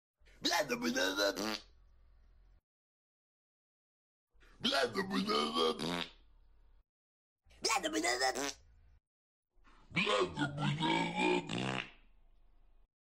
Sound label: fart